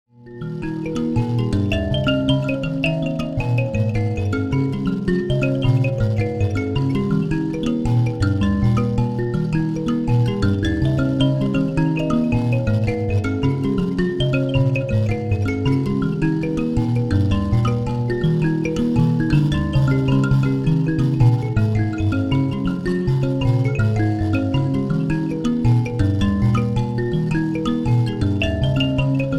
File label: mbira